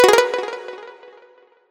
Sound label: combo; quatuor